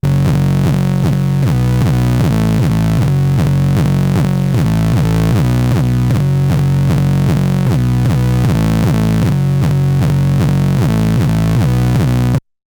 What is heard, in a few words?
MS-20 Cycle Bass